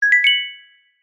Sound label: multimedia notification bell glassy chime